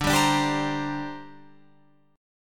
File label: D 7th Suspended 4th Sharp 5th down Guitar Open F; D7sus4#5; strummed